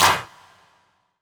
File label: CLAPS HIP; Best Service Dance Mega Drums